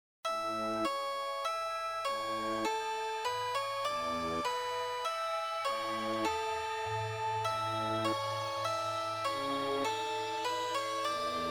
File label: music